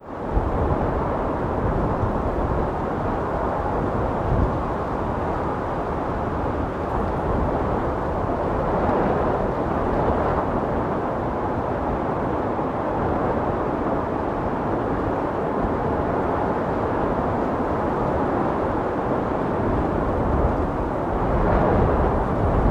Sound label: Wind; Lotr